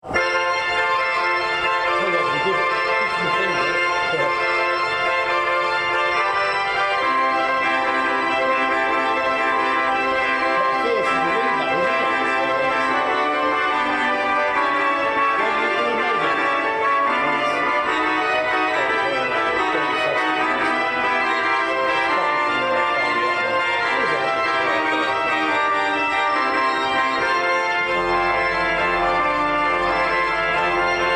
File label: Toccata